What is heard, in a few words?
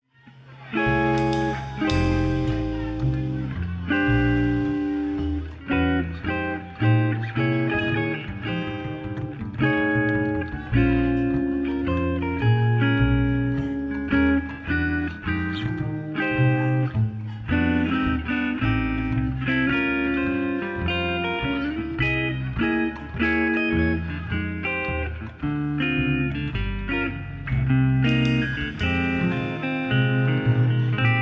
blues in F minor